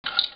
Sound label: phone pickup